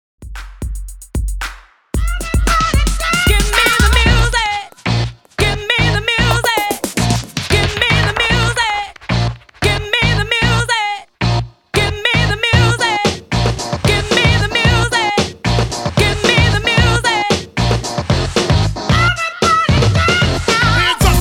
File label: Party Break 113 BPM